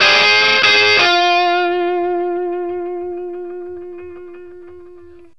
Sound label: BLUESY F 60, Vintage Blues Guitar; AKAI; USB Soundscan vol.22